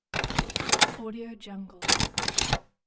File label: disk drive insert and eject; electronplanet